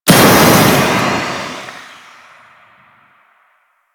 Forward Transition; Warframe; Vehicles; Archwing Demolition

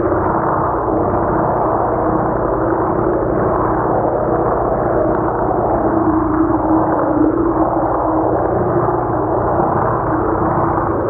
Water Pump Flow Loop; Ambience; Props; Warframe; Grineer Forest

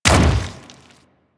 Ancient Tank Step Default, Infested footsteps; Warframe; Emissary Ancient Tank

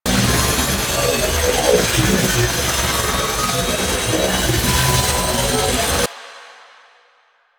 Corpus Projectile Loop; JadeShadows; Ascension

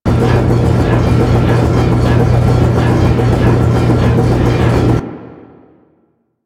Gas Turbine Loop; Ambience; Warframe; Corpus Gas City

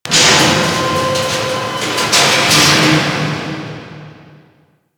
Ocean Conveyor; Warframe; Grineer Ocean

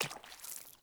Sandals Run Water, Footsteps

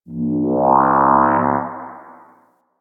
dark texture; Warframe; Corpus Railjack; Ambience

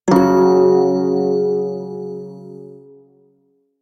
Prime Shawzin Chromatic Chord D; Music; Warframe

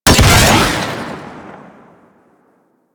Arch Cannon Shot, Weapons; Warframe; Archwing

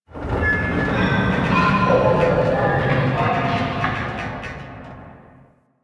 Ship Energy Rumble; Ambience; Warframe; Corpus Ship Remaster

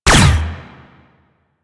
Laser Weapon; Laser Weapons

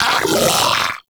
Arachnoid Vocal Player Spotted; Warframe; Corpus; Arachnoid Hunger

